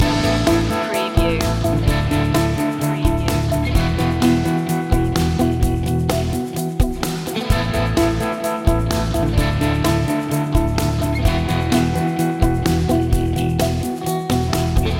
sidewalk rock loop

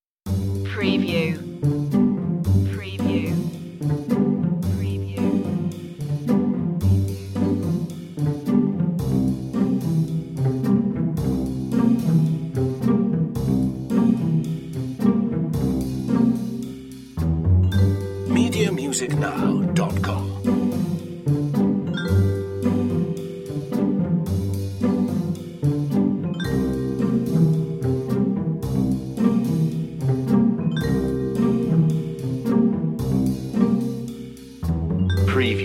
no strings pizz lead no xylophone

